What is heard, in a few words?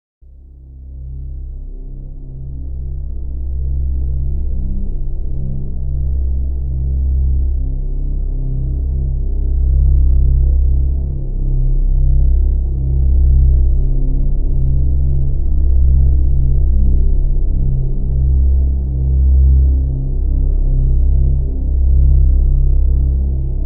Subsonic Bass; Bizarre Planet